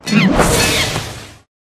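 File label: Pet Monkey buff; monkey balagur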